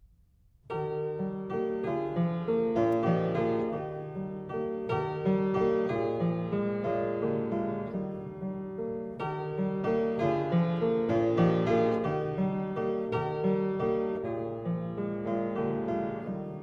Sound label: Twelve variations on the french song 'La belle Franoise', Keyboard Works; Mozart